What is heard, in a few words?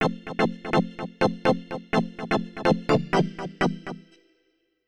ORGAN VOCAL 125 A; Keys